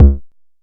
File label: analog quick, Kickbank; Ableton Foundation FreePacks